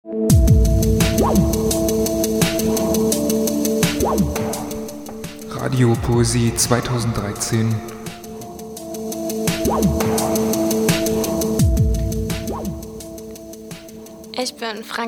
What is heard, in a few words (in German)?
Piano; Franka Hans